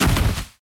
real down, battle

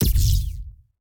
booster

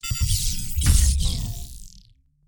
logo animation

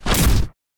punch heavy

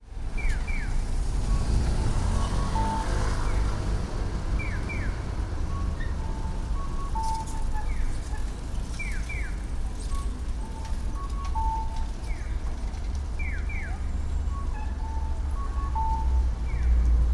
intersection

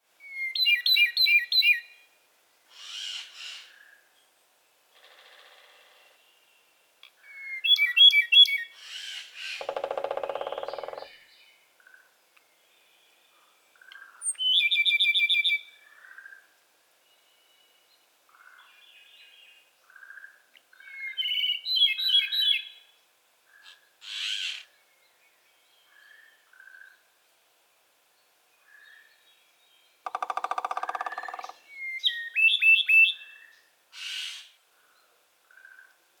amami nature

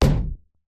desk hit

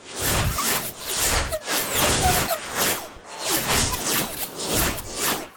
magic ball dodge

